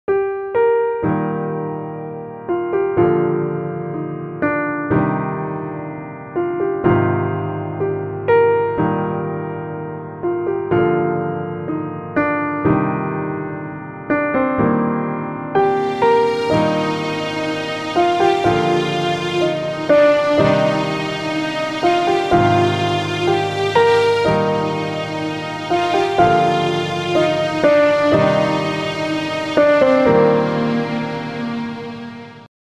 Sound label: Piano